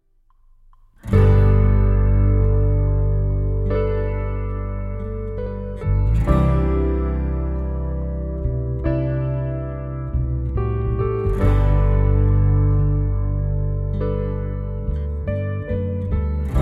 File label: Acoustic